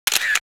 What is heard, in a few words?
Auto Series & Split, Camera; SKY IM-S610K; System Sounds